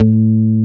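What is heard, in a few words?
SYBA A2, Synbass; STACK BASS; AKAI S6000 CD-ROM - Volume 1; BassGuitar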